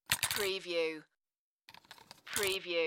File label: Foley, Insert Batteries Into Battery Compartment